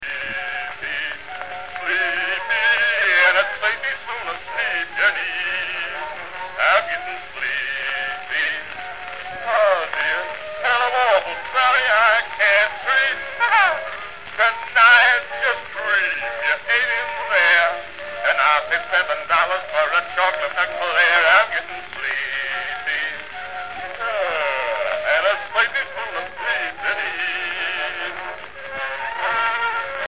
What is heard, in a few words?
Standard With Blue Horn